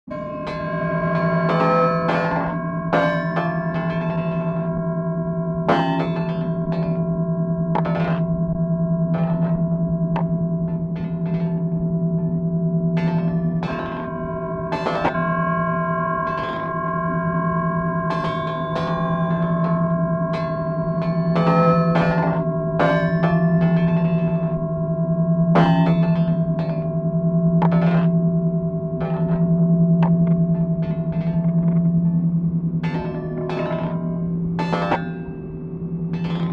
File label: metal